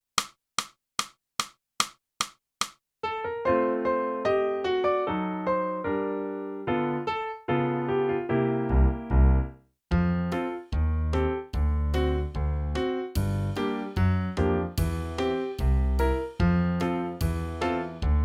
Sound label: clarinet; (Morgan) - Boosey Woodwind Method: Clarinet (Book 2)